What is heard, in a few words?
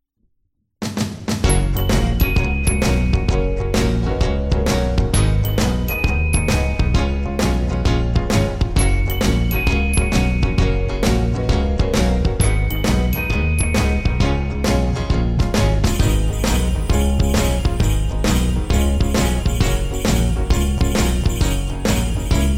instrumental version